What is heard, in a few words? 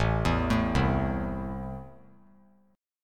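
A Minor, chord strum; JGuitar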